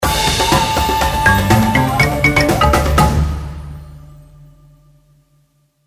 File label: you won; Stampede Call of the Pride; Eyecon